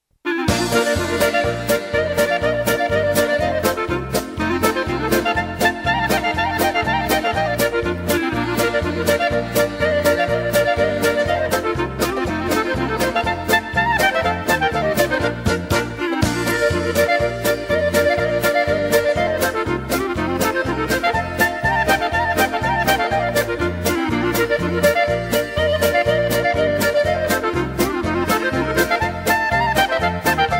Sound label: Clarinet Polka